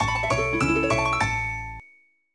Ambient; My Make Up; nds

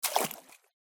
walk in water

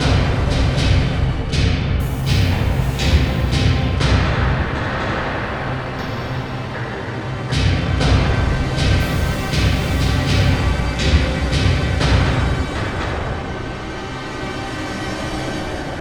Danger Tonal; Insanely Twisted Shadow Planet

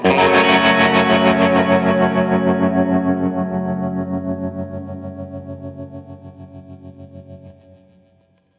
Mission Music Event Music; starcraft 2 wings of liberty

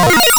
Pickup Object; terminator the genesis

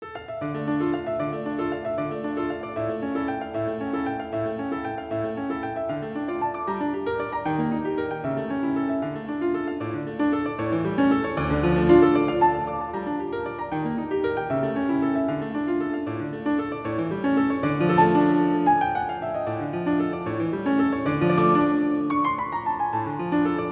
Piano Sonata No.17 D minor; EVE New Generation X; Op.31 No.2 Tempest; 3.Allegretto

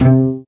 String Pluck

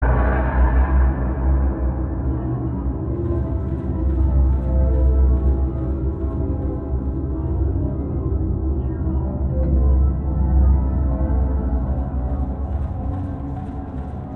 FEAR (AMBIENT); F.E.A.R. Perseus Mandate

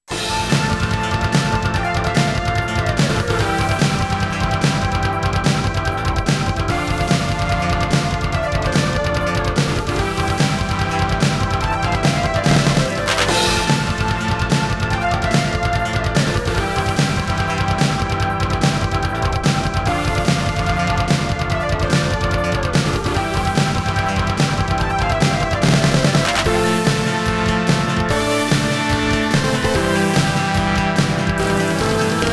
(YM2151 + SC-55), X68000; Cho Jin

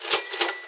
SFX Cash

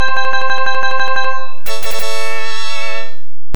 Education - Correct Answer; Adventure Tsukuru II; PC-98

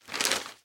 Document Swap Page

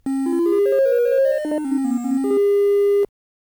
Try Again Music; Phozon; arcade

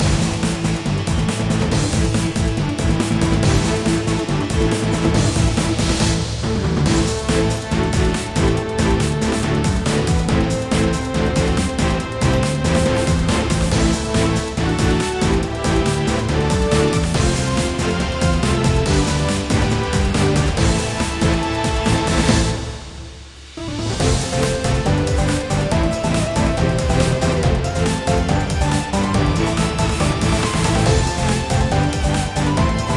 Grand Bgm

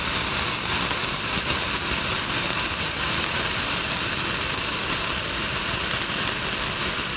fuse burn loop; the 39 steps

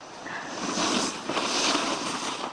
Ice Sound; Avalanche A Penguin Adventure

